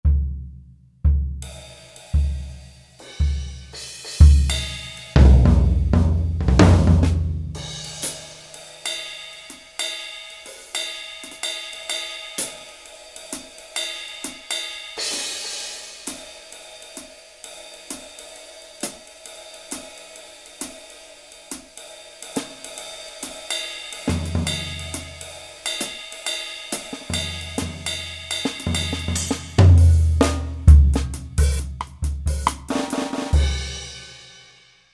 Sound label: Jazz Mix KIT, Roland SRX-01 Dynamic Drum Kits